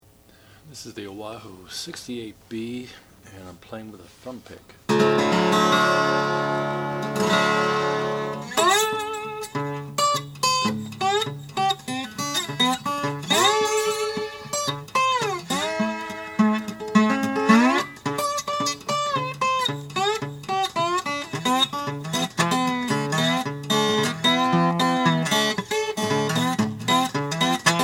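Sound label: oahu 68b jumbo sunburst, Guitars; 1935; square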